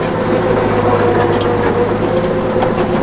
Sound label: missile truck, rumble; golgotha